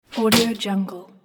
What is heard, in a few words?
human spit